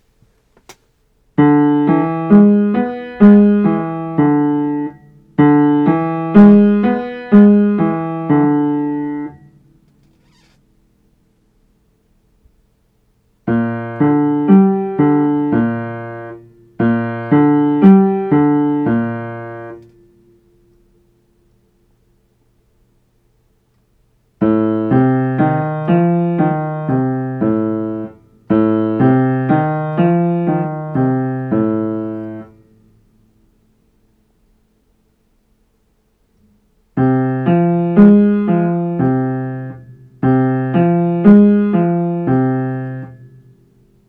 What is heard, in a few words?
Figured Bass; Aural Training exercise